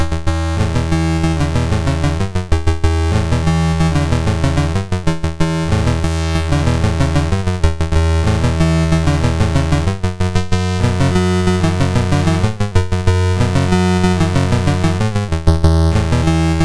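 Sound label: Magicians Ball, MOS 6581 SID chip music, games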